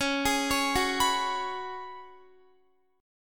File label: Db 7th Suspended 4th Db down Guitar; arpeggiated